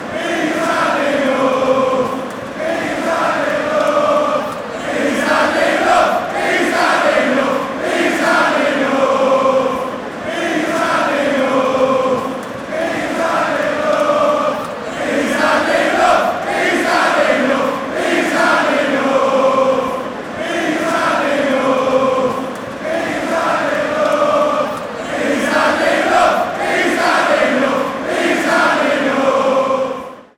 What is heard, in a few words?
fan chants